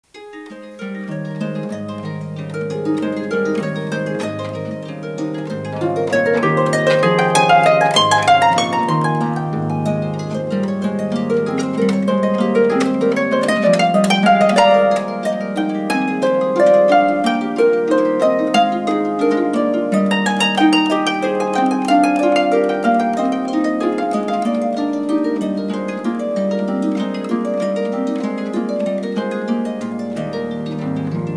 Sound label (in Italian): toccata per arpa